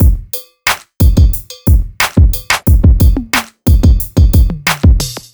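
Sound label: Cow Bellz, hip-hop drum samples; сэмплы ударных; хип хоп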